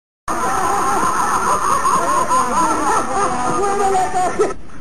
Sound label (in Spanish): risas radio